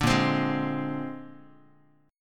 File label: Bb 9th, Guitar; strummed; JGuitar